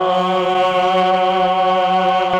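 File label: choir F#2, MELLOTRON; Retro Sampler; Choir + Flute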